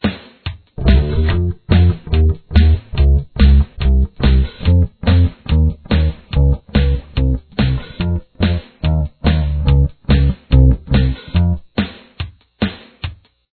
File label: chorus bass